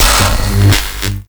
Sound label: Void Dragon Ring Sparks; Enemies; Gameplay; Warframe; Duviri